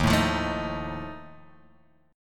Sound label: Eb Suspended 2nd Flat 5th Eb Guitar Open G